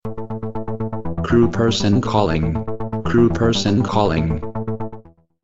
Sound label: Acoustic